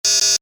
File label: Tech beeps, Beeps, High Tech; Tech Sound Effects; Tech (Sound Effects:Science Fiction); Stuart Duffield